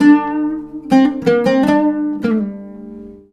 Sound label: LUTH H, Arabian Traditions, LUTH RIFF; USB Soundscan vol.43 - ArabianTraditions; AKAI